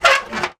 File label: industrial metal ladder scrape single short; zapsplat